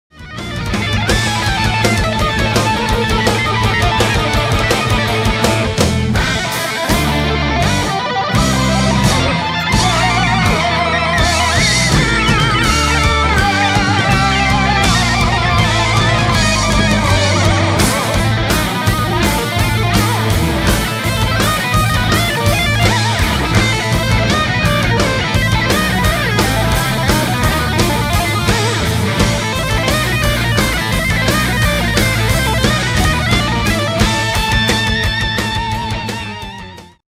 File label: Live, Guitars; Raleigh Music Academy